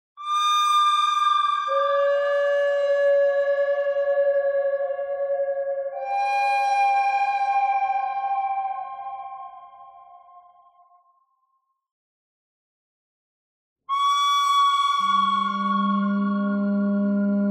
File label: bird thread harmonic; noreverb